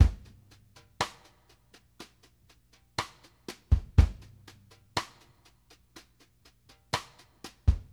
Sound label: STRGHT AHEA, ballad 60; Sampleheads; New York City Drumworks VOL-1; SP BALLAD 60